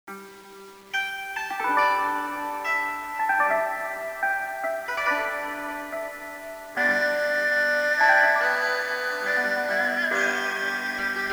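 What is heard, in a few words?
background music asian; yakuza ryu ga gotoku kiwami 2 pc gamerip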